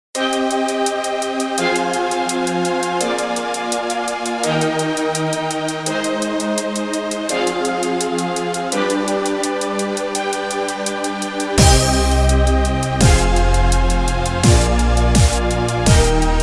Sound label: Winter Bass